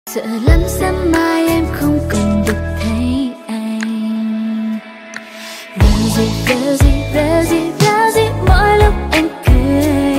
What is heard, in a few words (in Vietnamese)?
nhac chuong